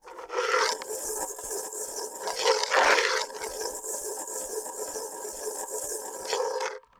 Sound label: Coin On Collander; Coins